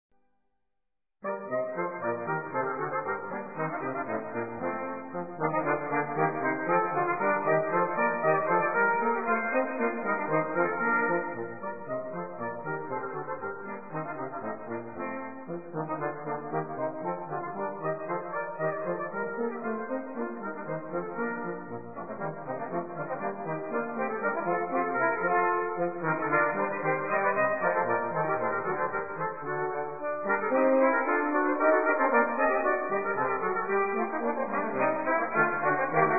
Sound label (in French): QUATUOR